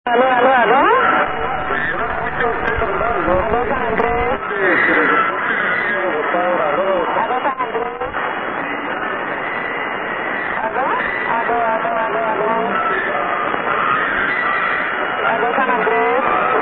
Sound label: usb